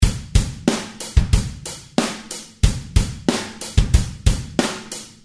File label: Loop Default, Here's the drum pattern played with the default kit; without any FX added; EZdrummer